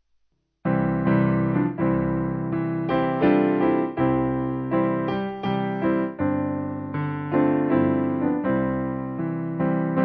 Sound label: The Love Of God, Piano